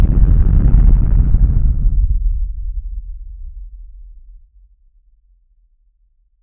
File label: the sound of an explosion